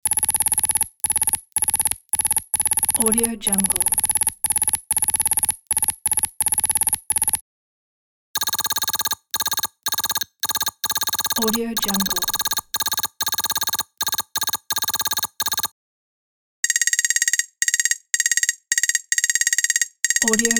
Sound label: digital interface text